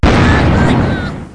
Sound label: Bomb Explo; Rabbids Big Bang